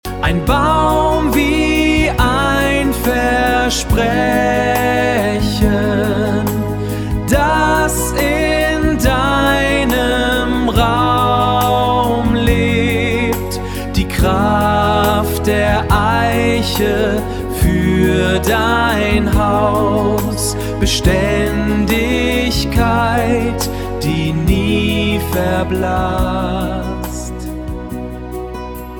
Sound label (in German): Eichen jingle